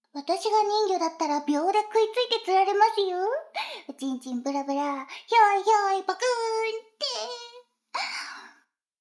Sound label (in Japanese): フェラ音声; 逆時間停止されて